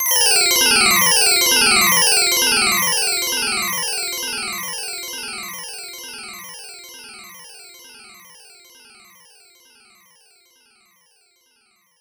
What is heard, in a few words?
Time Out Warning; The Speed Rumbler; arcade